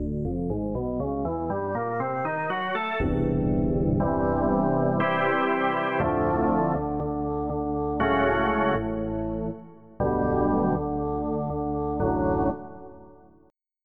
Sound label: Organ 3; FF7